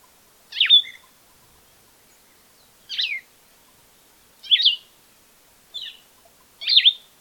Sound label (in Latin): Vireo vicinior